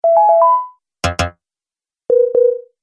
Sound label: Multimedia Small Sound Pack, high tech sound effects